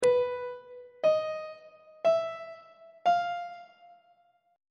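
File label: a double sharp diminished 4th perfect 4th augmented 4th intervals; treble clef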